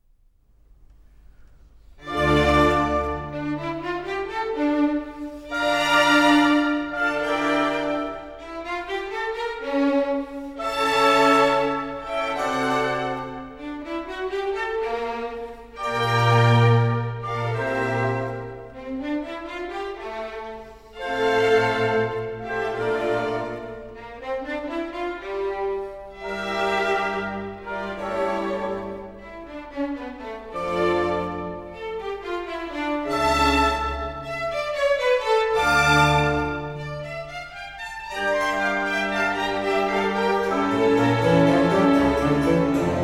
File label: Concerto Grosso in D minor; Handel Masterworks; Klassika